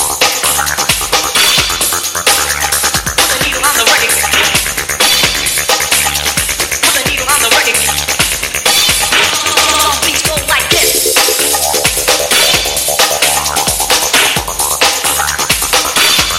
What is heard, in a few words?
needle beats ringtone